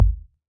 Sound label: Ball Collision Geo Tackleball Light